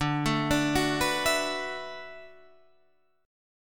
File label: D 6th Add 9th D, Guitar, Open D